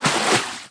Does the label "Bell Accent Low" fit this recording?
No